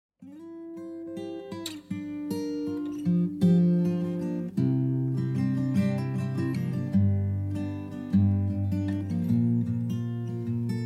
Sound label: Acoustic Cover